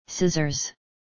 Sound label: Scissors